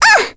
Vox Down; Requina; kingsraid

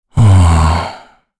Vox Sigh; Kain; kingsraid

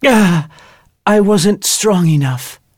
Vox Dead; voices; heroes; en; Arch; kingsraid

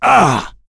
Vox Damage; kingsraid; voices heroes; en

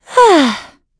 Vox Sigh; en; voices; heroes; Estelle; kings raid

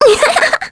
vox Laugh; kingsraid; voices heroes en

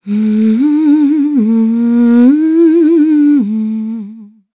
Vox Hum; Aselica; kingsraid; voices heroes

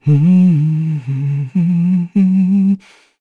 Hum; Lucias; kingsraid; voices heroes

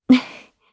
Vox Laugh; Chrisha; jp; kingsraid

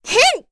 Vox Jump; Cecilia; kings raid; en

